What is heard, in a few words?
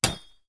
Pickaxe; Hollow Knight; Myla Pickaxe